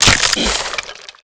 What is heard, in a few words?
skeleton death